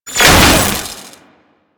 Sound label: Security Camera Destroyed; Objects